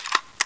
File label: m2 assault rifle reloading